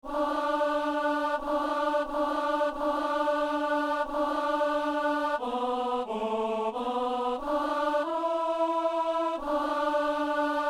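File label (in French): ténor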